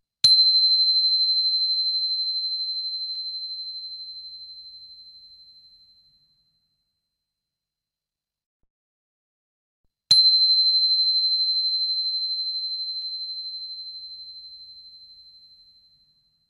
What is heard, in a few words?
Chimes